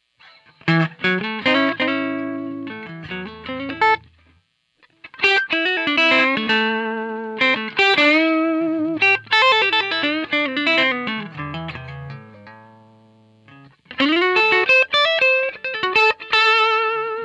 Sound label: noodling JTM45; 18Watt